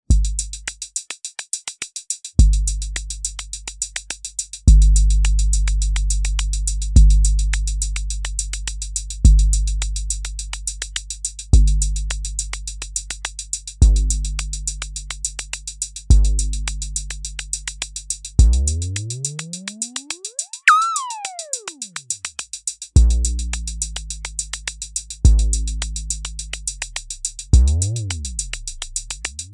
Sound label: Kick